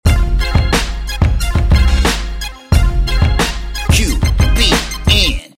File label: jingle